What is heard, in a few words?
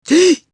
gulp; Greece